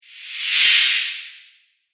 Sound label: Sand Whoosh Strong; Whoosh Sound Effects; sound design